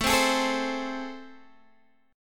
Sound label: A Minor Major 7th, down, Guitar, DADGAD